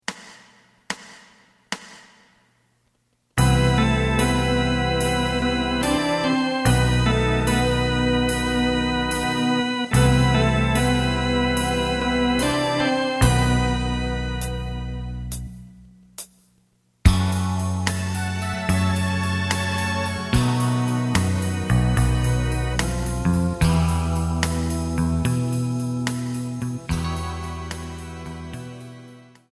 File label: lead